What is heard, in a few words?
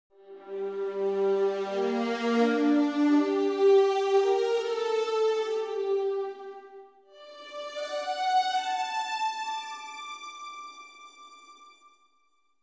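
strings passage with solos; expression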